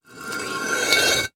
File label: Cinder Block Slide